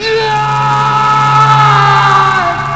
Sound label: agony